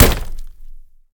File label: Door Close Meat; MiSide